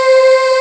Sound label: SYN Fantasy; Best Service Gigapack 1; SYN DIG2